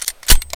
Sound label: de clip in, deagle